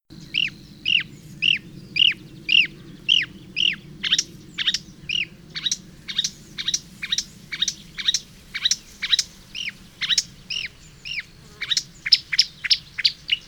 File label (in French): rousserolle verderolle; chant